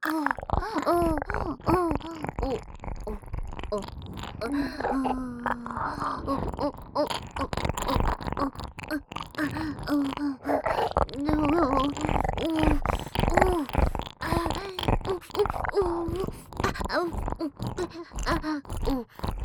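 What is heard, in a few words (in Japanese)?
オホ喘ぎ SE無＋ぐぽぐぽシンクロ耳舐め; 3WAYループ; おまけループ（オナサポ）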